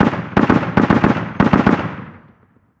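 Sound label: gun 50cal distant; ModernWarfare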